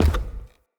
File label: Step Wood, Footsteps; Enemy; Characters; Corrupted Sproutfolk